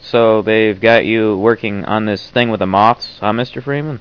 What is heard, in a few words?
moths; redbarn